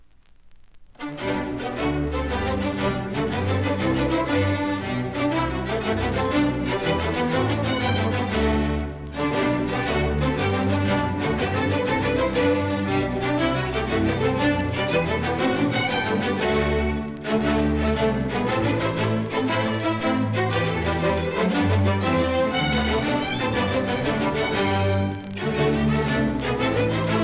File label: Water Music; Handel